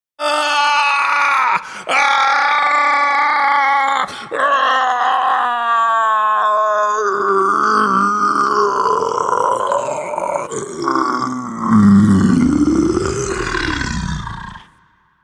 Man Into Monster, Transformation of man into monster.; Monsters (Sound Effects:Horror)